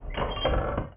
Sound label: chest open; The Elder Scrolls Travels Shadowkey; Nokia; N-GAGE